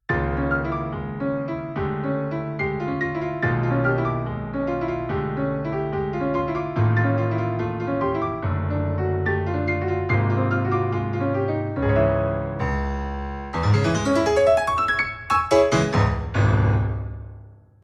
Moonlight Sonata but i got distracted a little bit